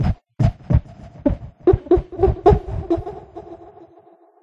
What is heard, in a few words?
Jungle Monkey; And Yet It Moves